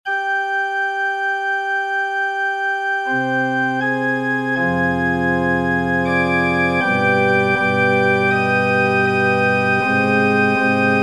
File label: pipe organ; herzogenberg heinrich von; siehe trost war mir sehr bange